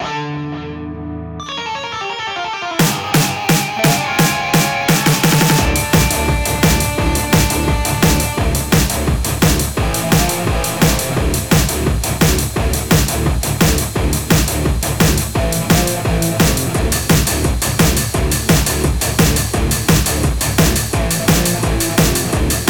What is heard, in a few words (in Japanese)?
メタルのように歪んだギターがかっこいい曲を目指しました！; タイトル通り、爆発系の能力を使うキャラをイメージしています。; イントロあり; 音楽素材